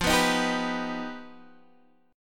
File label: G Augmented 7th